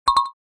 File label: Click error